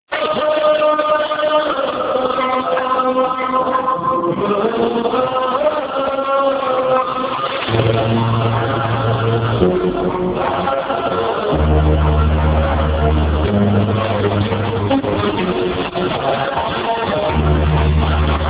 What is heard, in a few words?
Aks music